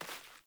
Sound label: Sandals Walk Vegetation, Footsteps